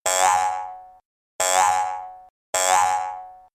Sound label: bouncing sounds